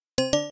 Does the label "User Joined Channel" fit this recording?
Yes